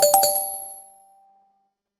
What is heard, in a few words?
pluck